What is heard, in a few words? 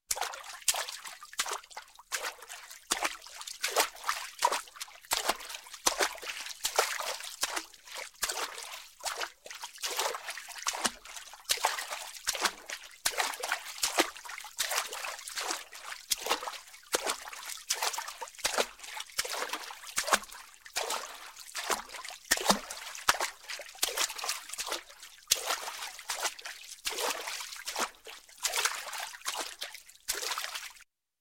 Footsteps, Water Barefoot, Slow, Seashore; Sound Ideas 2000 Series